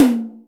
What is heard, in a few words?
Amb Tom H, Acoustic Tom; Yamaha DTXpress